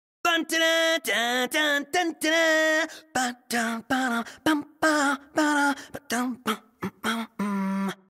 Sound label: victory fanfare; final fantasy xv